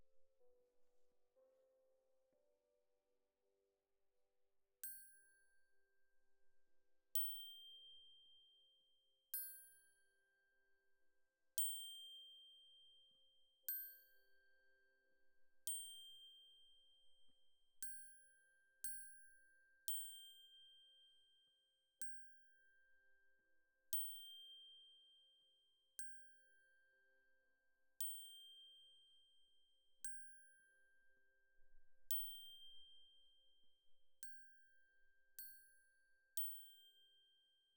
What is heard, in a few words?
Glock Close